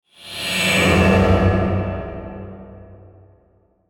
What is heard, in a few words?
Whoosh Robotic Ghostly; Blastwave; Whoosh Sound Effects